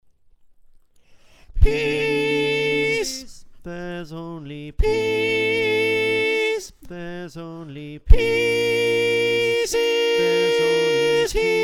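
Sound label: soprano, choir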